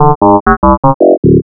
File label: alien signal